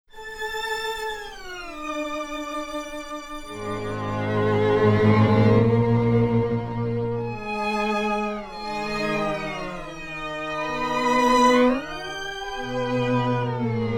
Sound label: Arco Glissando; Brother Bunny